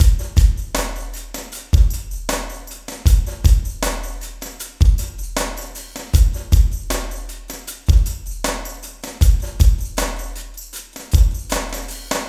LOOPS

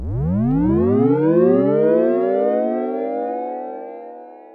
Sci fi

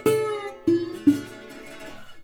Blues Guitar Samples; ILIO - Fretworks

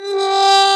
brass plunger mute; Plunger & Wa; Roland LCDP12 Solo Brass; 90's Sample CDs

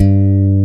G1 STRAT

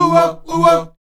Oh-Wa Oh-Wa, Afro Chants; Roland LCDP11 Africa VOL-1

